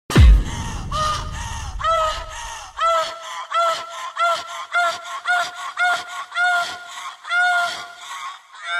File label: Dirty Sound